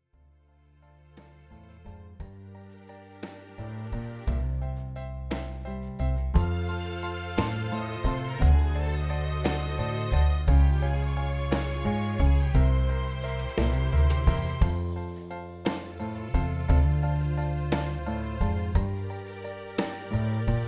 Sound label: Instrumental